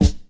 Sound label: old school shiny kick one shot d key; tuned drums d key